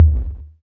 small reverb tom drum sound c key, Royality free tom drum sound tuned to the C note.